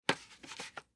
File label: Pickaxe Pick Up, Pick Axe Pick Up Handle Pickaxe; Yard Tools; Blastwave